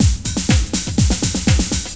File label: drum loop; awe32